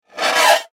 Impact Metal Scrape Short; Materials

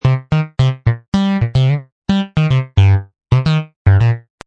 Funk Synth A, Synth loop in A# at 110bpm.; Synth Loops

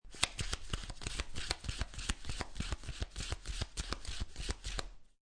Playing Cards Flip Cards Off Top Of Deck

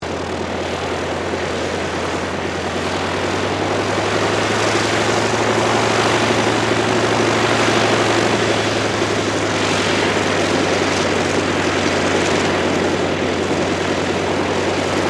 AIRCRAFT CAC WIRRAWAY PREFLIGHT; CA-16 Wirraway; Airplanes; Vehicles

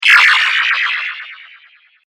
Transporter, Rematerialize, Science Fiction; 5.1

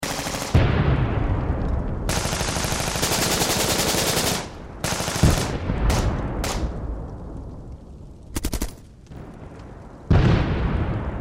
Battle Modern War Loop; Military Battlefield; Warfare; Battlefields

